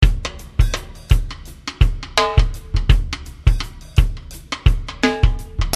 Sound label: Jazz Shuffle